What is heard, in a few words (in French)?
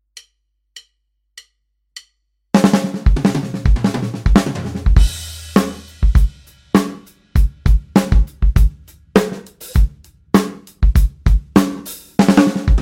Triolets de doubles croches, baguettes; Drums; MusicRadarFrance; A vos baguettes; Pedago